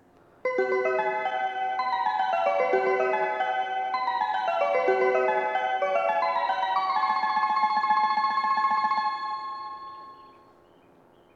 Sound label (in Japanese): 発車メロディー 1.9コーラスです。上り普通列車の発車です。